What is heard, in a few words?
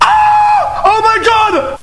ahh